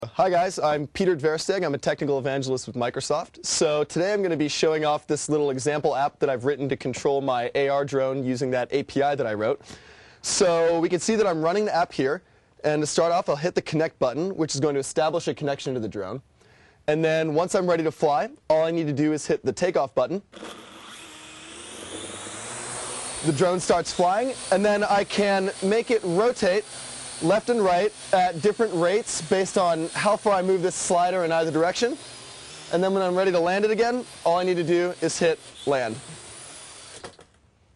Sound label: arDrone